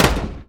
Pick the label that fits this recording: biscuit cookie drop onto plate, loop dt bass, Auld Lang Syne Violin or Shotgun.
Shotgun